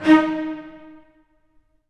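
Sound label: Cello Spiccato D#1; Digital Sound Factory Virtuoso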